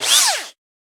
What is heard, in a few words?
Drone; Rabbids Multiverse